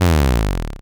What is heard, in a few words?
missile; Republika Exhibtion Games; luftrausers